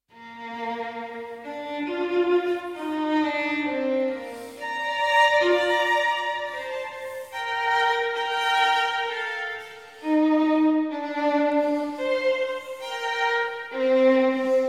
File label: Sonata in G Minor for Solo Violin; No. 1; BWV 1001